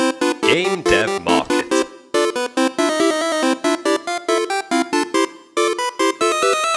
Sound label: Victory